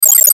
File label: Data Transmission Fast Number Beeps; Data Transmission Fast Number Beeps Burst; Communication; Blastwave